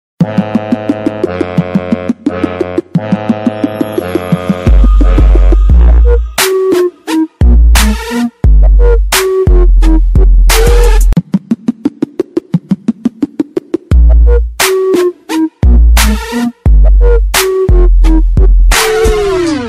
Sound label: Message Tone